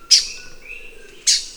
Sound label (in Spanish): canto llorosa, Llorosa de Puerto Rico